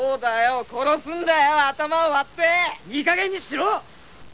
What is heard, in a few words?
whining, japanese